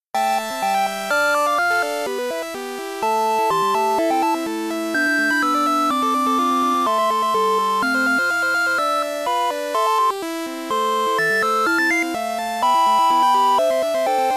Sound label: square Saw